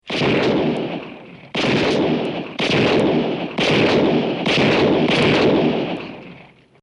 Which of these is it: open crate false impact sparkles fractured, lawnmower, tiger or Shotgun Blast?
Shotgun Blast